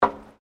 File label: FOOTSTEPS WOMENS LOW BOOTS ESCALATOR STEP; Footstep Foley; Human